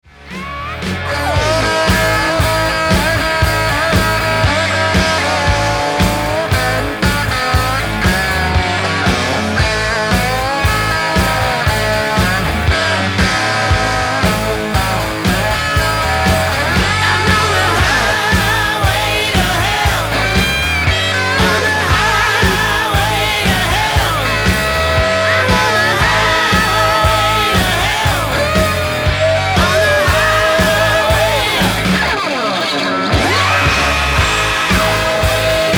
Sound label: solo